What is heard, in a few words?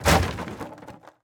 Wood destroy